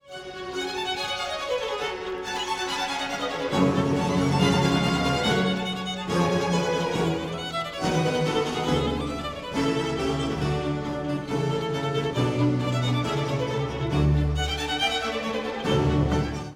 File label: Violin